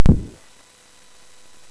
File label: SFX, MOS6581; Narco Police; MOS6581R4; hvsc